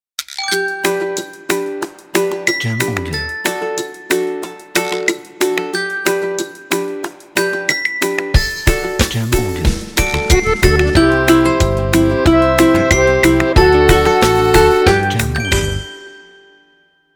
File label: Funny Kids Intro